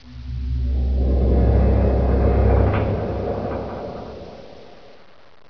groan; dm borehole

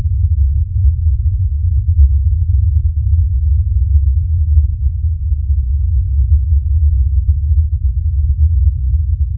wind bass

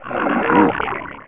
alert; mystic radar; mr zombie1

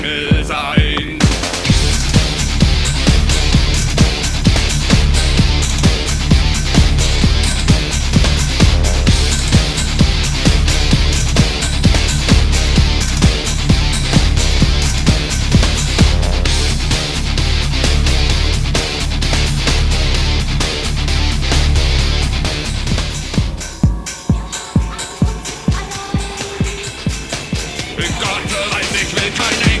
mini metal

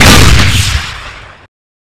piat rocket, weapons; svencoop; ww2projekt

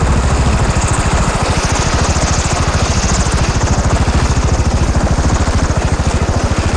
blackhawk idle loop

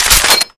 mag slide; weapons; deluge

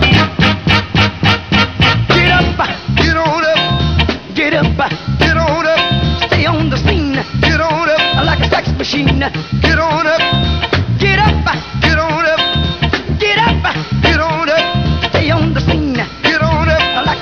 invasion music

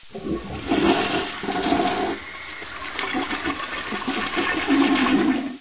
toilet flush; csde attic

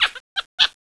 monkey; copan

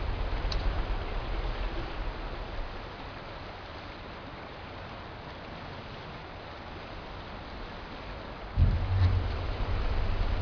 nuke ambient

